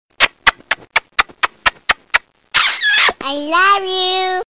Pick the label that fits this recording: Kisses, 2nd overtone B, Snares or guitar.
Kisses